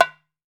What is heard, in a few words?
ROSEWOOD, African Drums; E-MU Formula 4000 Series Vol. 4; Earth Tones